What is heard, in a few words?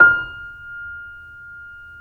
C7; East West; The Ultimate Piano Collection